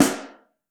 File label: SN Mono, Snare